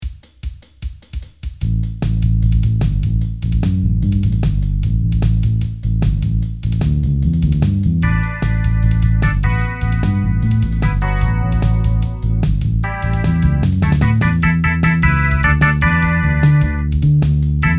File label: old bass thing